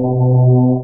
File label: lava